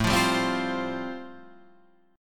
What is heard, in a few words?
G 7th Suspended 2nd Suspended 4th A